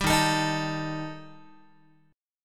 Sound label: D sharp Major Flat 5th, Guitar; strummed; D#Mb5; 10,11,12,13,13,x; JGuitar